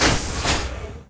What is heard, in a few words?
harness open; Quake 4 Alpha; id software